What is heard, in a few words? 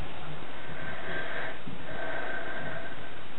breathing